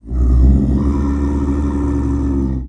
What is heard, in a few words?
Leopard Snow Growls; big cats